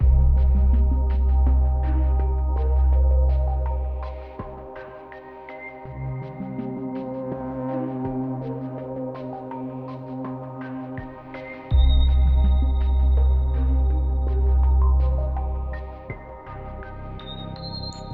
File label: Ambience 82BPM